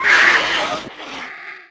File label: death, aliens facehugger; svencoop